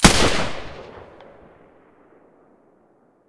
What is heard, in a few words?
silencer g36 stereo, weapons